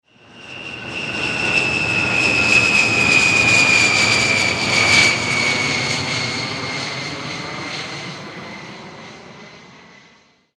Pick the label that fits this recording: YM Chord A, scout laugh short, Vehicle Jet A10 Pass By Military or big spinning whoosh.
Vehicle Jet A10 Pass By Military